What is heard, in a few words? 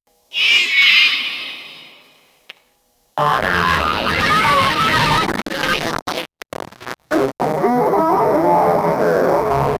tan tan tan; alert